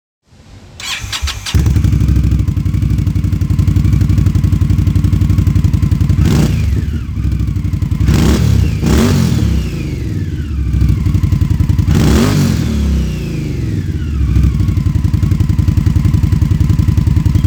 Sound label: Indian scout stage 1